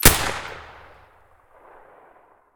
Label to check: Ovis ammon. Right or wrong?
Wrong